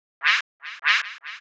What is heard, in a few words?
HOUSE FX 125